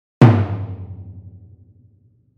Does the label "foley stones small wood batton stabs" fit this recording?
No